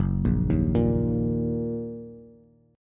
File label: Gb 6th Gb Bass; Gb6 arpeggiated; JGuitar